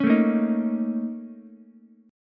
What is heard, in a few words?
Bb Minor Major 7th Double Flat 5th, Ukulele; strummed; chord shape; 6,9,6,6